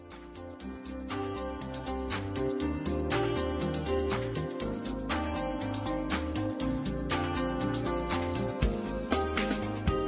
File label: Corporate